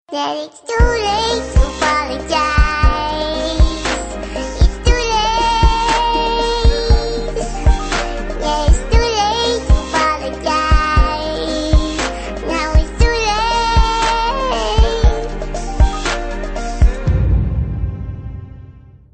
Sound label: Baby Voice; meloboom